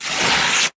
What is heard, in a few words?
Paper Tear; Office Supplies; 1001 Sound Effects